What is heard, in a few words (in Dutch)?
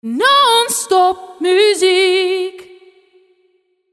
Non Stop Muziek (solo zang vrouw); jingles